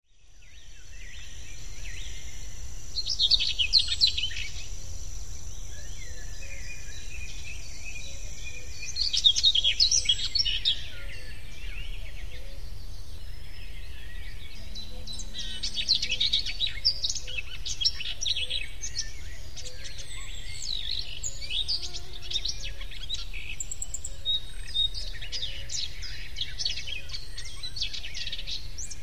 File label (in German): teich wiese